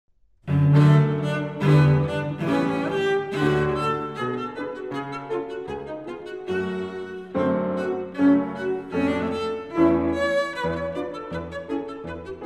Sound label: gavotte; reinecke; 3 pieces